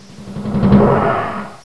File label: Techno